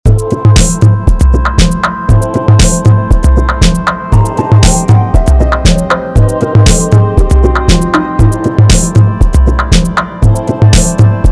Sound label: Electro